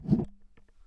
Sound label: metal swing